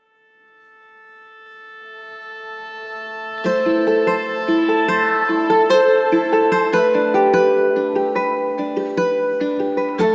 Little Guitar

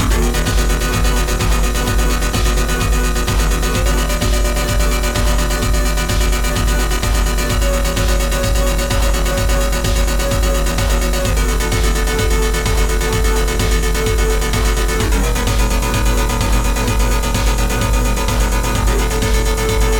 pattern better drums; linglin art

